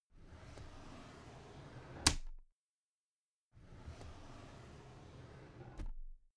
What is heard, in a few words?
drawer cabinet carton open soft